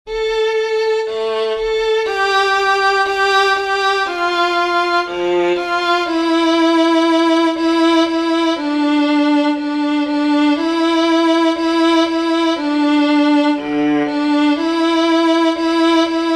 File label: A short piece for violin